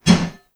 Heavy sword woosh

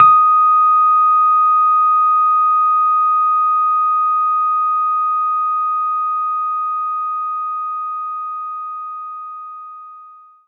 Rhodes Eb5

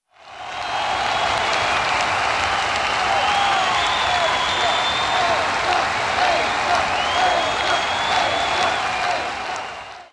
Applause Low; monster truck destruction; android

